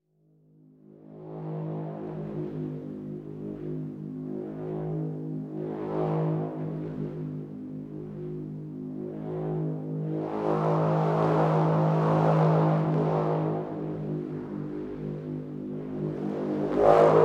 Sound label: spiritcatcher G#; best service Blue Box